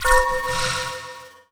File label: Powerup